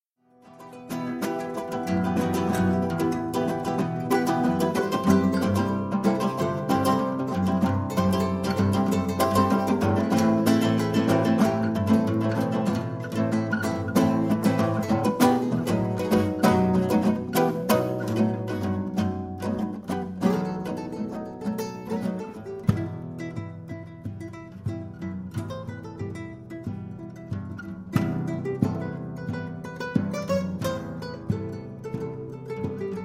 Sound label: Trio Impro